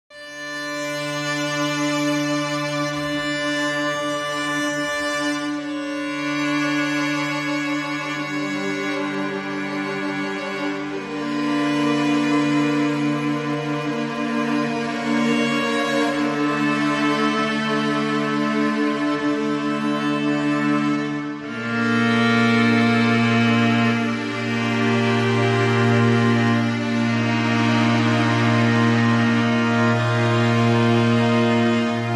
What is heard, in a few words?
Forest No Bass